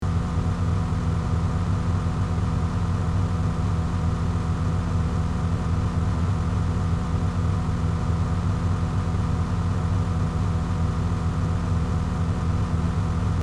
Sports car engine idling loopable

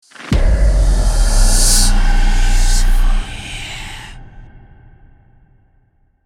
Scary whispers whoosh transition; suspense

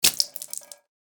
Blood or gore splatter floor surface

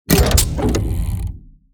Chaotic steampunk impact with mechanical movement; gfxsounds

